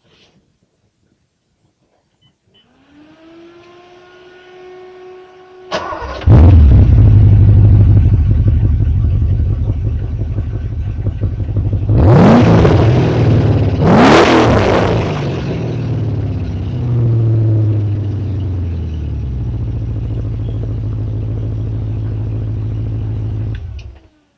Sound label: 928 exhaust